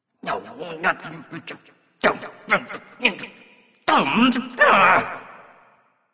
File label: grumbling; smite; ahpuch